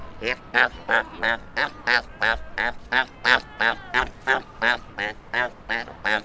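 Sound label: Australian fur seal in air male bark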